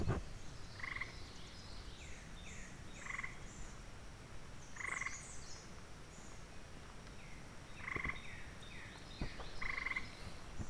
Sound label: Red-headed Woodpecker